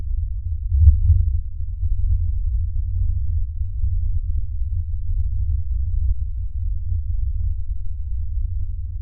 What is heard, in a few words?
thunder denoised